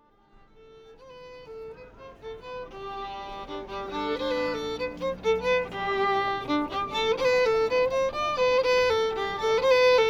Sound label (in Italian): violino